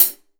OHH, Real Drum Kit, SWING KIT; Best Service ProSamples vol.43 - Real Drum Kits

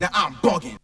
VOX; Zero G; Man Machine

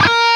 LEAD A#3; East West; Steve Stevens Guitar Samples Collection

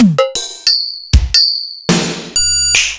Korg M11

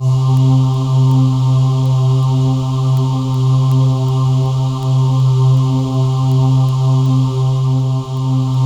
C3, Synth Leads

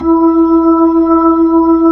B-3, Jazz

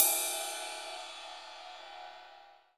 CYM Ride; Best Service Gigapack 2

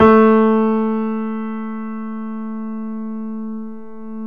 PIA GRND, Roland; Sound & Vision; Gigapack I CD 2; PIA GRAND 2; PIA Grand 6

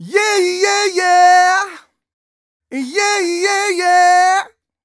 128 uh e-flat, mal, Vocals

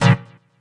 chord nitro A#, Chord Shots, Chicago House; Classic Chicago House

